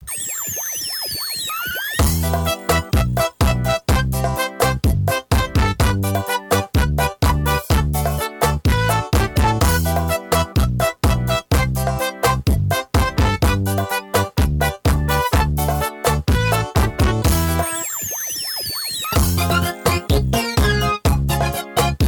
kit ilu

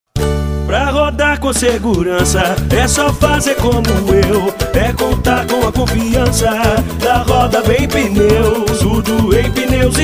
jingles roda bem

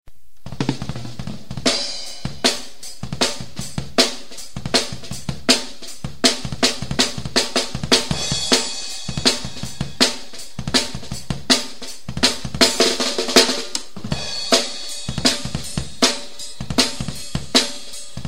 groove de batera; groove de batera de 2006

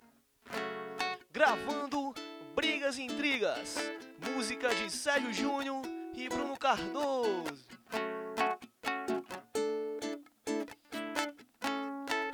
ensaio acustico

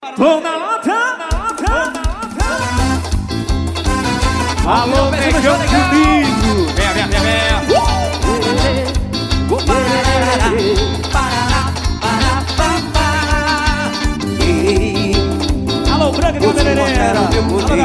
explosao musical ao vivo; no lata folia 2010

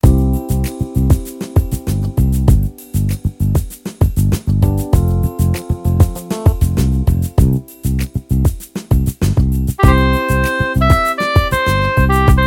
melancolico

